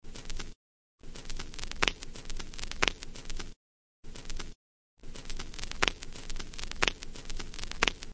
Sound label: 118 dirt dirt wheep wheep